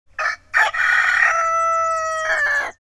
Village rooster crowing distant perspective

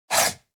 Piece sliding on wooden board game

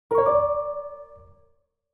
Video game reward chest opening spooky

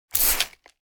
Barber shop spray bottle spraying

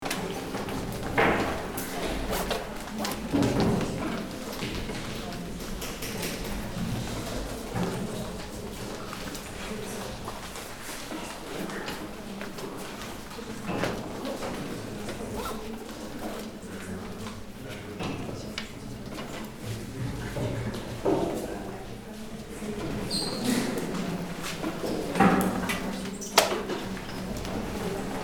Students in class light chatter school loop

